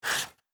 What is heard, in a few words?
Button Over; Custom UI Sounds; Call of Duty Black Ops Cold War